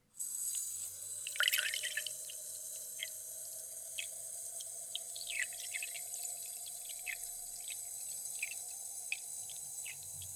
zvuk nalivanija vody v stakan

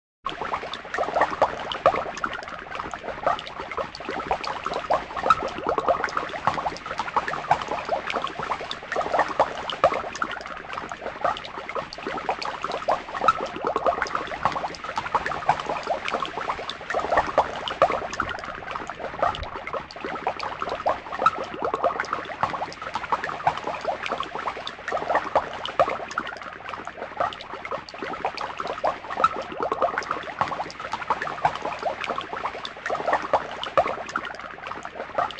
zvuki vedmy

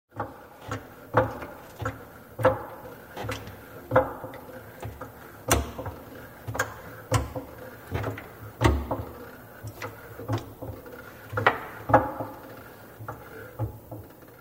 zvuki shinomontazha

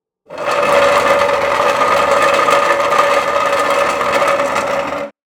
zvuk taburetki

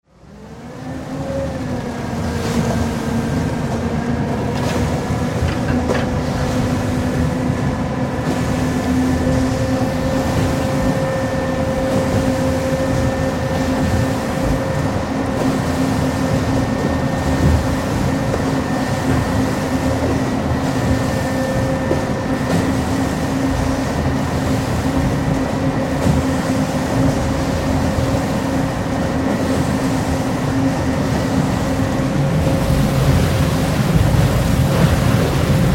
zvuki betonomeshalki

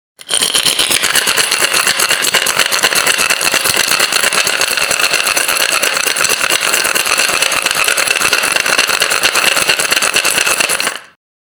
zvuk trjaski

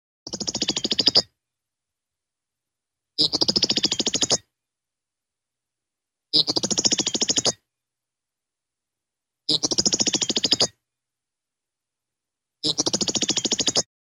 zvuki zhavoronka

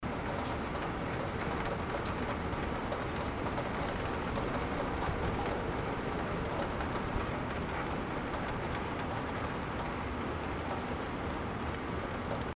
zvuk dozhdja

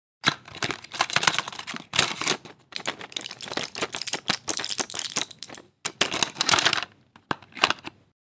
zvuk tabletok

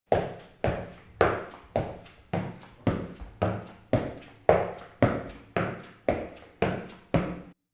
zvuk shagov

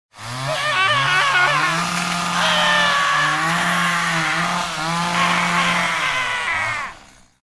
zvuki benzopily

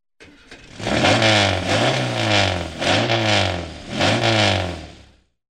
zvuki avtogonok

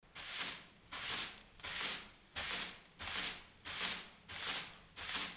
zvuk metly

